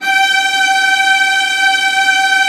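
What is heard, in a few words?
VIOLAS A5, Strings; ILIO; Synclavier Strings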